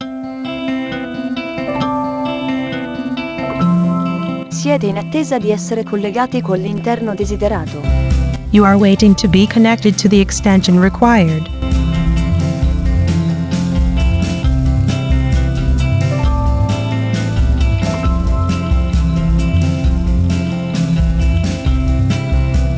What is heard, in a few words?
Attesa Ambient Ita Eng